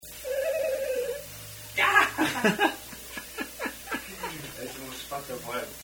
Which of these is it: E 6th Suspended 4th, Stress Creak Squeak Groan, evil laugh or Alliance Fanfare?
evil laugh